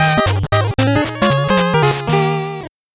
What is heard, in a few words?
8bit jingle fast